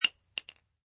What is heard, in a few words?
Bullet Shell Drop Pistol Cement, empty Pistol shell falls on cemen